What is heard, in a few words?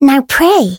Voice attack